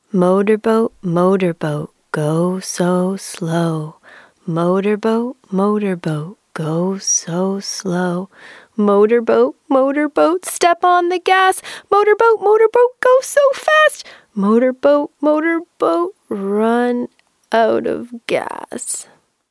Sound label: motor boat